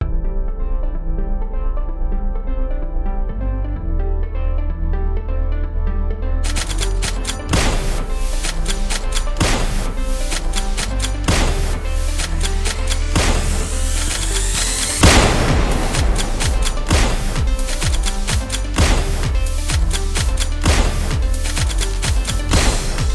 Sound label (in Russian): pojnt-petlya melodiya vibriruyushaya bistraya ruchnaya vspishka pushka ritm zvuka korotkij; saundtrek